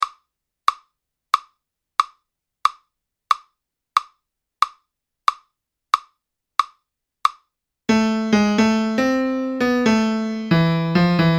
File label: blues 2 bassons voix 2